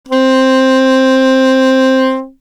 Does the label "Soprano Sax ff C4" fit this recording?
Yes